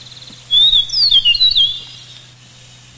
meadowlark E

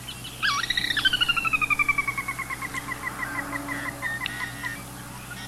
sora Rail

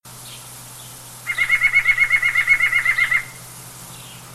nuthatch, songs

